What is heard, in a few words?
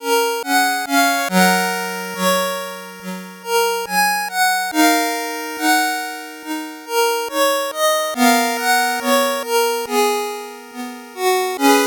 8bit bgm slow